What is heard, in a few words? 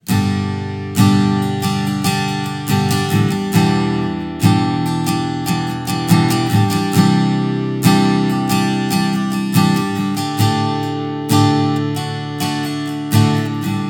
STRUM